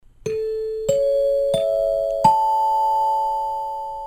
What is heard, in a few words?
four chimes ascending